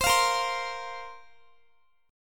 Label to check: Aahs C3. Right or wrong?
Wrong